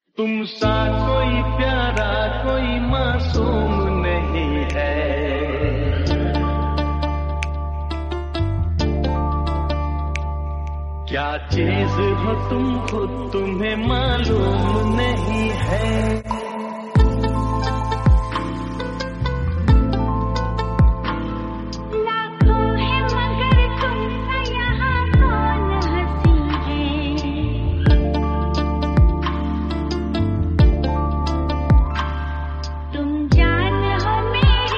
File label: slow version hindi dj remix